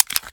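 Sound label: pistol reload fast mags mag out; gmod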